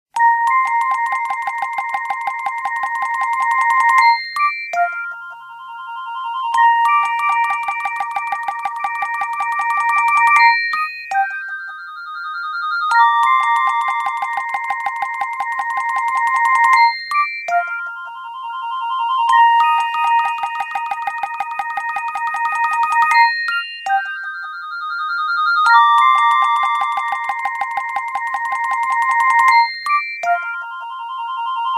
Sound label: Clock, Nokia Tones; Zenith; Windows Phone apps